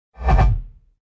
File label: whoosh master more dynamic